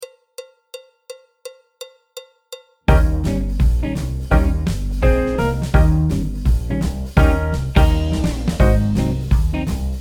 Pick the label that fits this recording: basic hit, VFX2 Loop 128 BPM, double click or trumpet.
trumpet